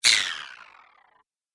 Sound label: Spell Dramatic Yet Soft, magic sound effect